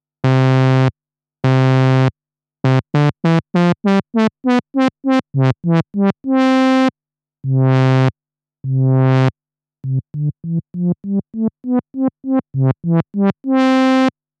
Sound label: Attack, synthe